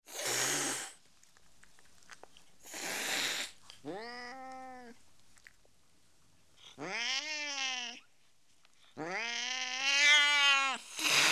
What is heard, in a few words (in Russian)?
Koshka shipit voinstvenno krichit